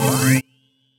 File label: RAVE, RAVESYN